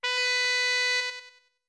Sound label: Notes Pipes B long